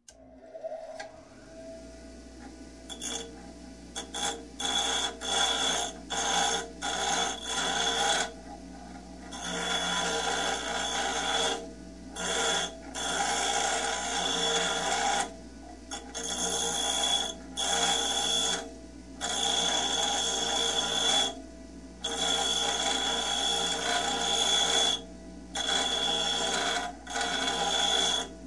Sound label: Construction, Grinder Grinding Metal; Sound Ideas 2000 Series